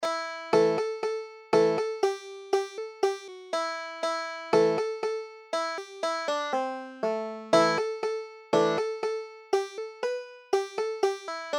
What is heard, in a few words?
brushy fork of johns creek, banjo